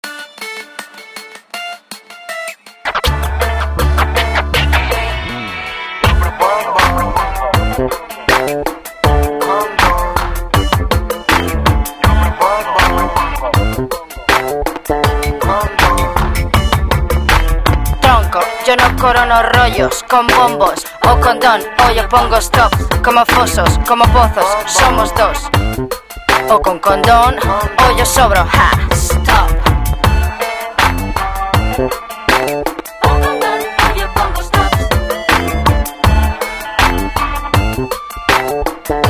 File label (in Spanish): jingle